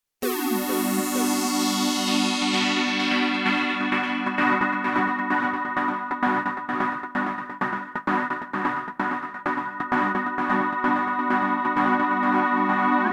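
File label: chord and square; OBXa V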